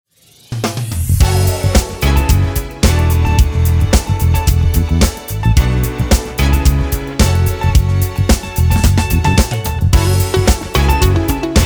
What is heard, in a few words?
House Disco House, 110